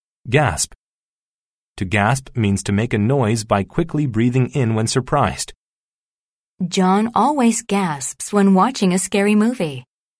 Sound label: gasp